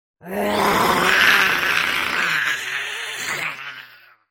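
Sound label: sounds of goblin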